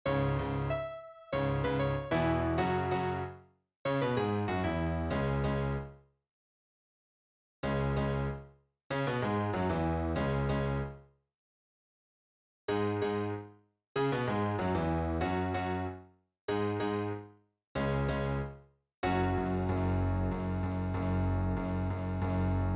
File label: accomp transposed; flashdance vocal audition materials; KEYANO THEATRE COMPANY AUDITIONS